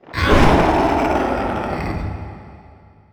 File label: toad die